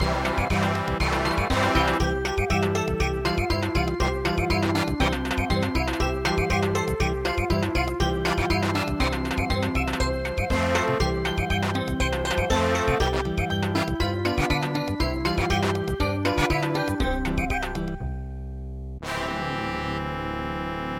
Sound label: Sarasponda samba